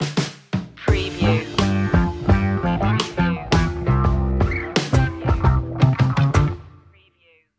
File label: sweet honey ident